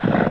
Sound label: bear jump; wizardwars